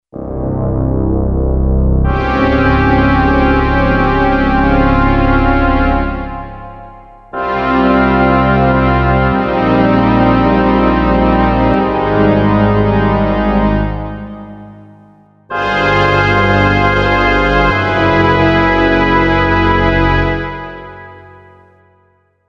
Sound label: Brass & Reed; Korg MSC-05S Piano